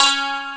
YANG-CH'IN; AKAI S6000 CD-ROM - Volume 1

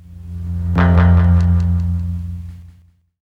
GTR, Hip Hop R n B; USB Soundscan vol.19; AKAI

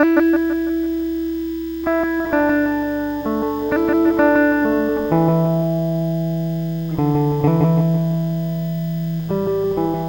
Guitar, Trip-Hop Loops

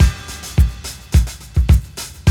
Loop, Roland; Sound & Vision - Gigapack I

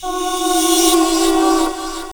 Lost City G, Pads; Drum Bass; Zero-G; Total Drum Bass

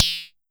Pollard Perc, Syndrum

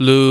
VOX LOO C4, Scats Choir; 90s Sample CDs; Club-50 - Foundations Roland; Short Scats

